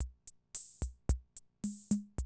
CR78, DrumLoops

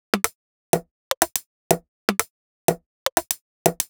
Top D 123bpm, House Drumloops; Frogslegs; Zero-G House Fabrik Drumloops

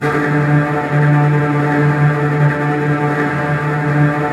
STR TREMOLO, Strings

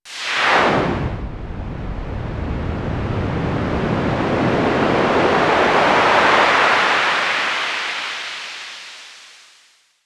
fx reverse; Ueberschall; Techno Trance Essentials

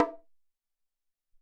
Bongo Hi, Alesis SR-18

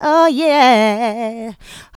Vocals, Adlibs; Houseworx

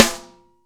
SNARES DRY; Real Mega Drums

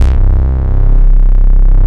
TAURUS, Moog Synths; E-MU Formula 4000 Series Vol. 3; Analog Odyssey

